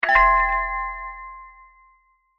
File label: correct